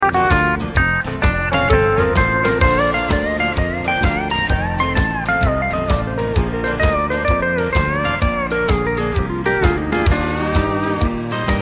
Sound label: chop, e9th